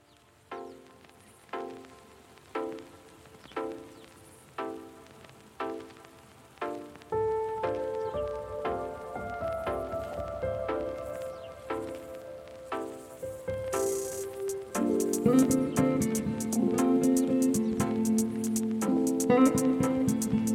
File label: instrumental lofi